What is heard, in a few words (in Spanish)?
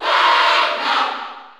Cheer Spanish NTSC; Pac-Man; SSBU